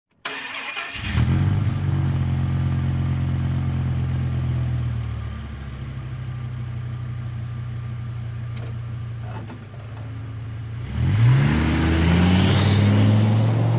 Truck With V-10 Motor Start And Fast Away, Dodge Ram